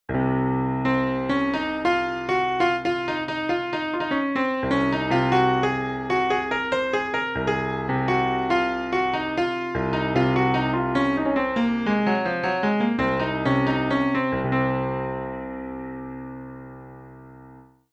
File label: Short Improvisation in C Locrian